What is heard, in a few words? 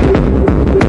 loop; pinati soundstudiolo